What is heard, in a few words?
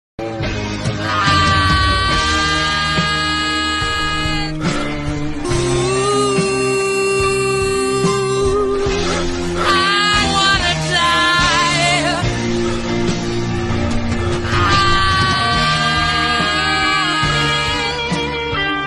aah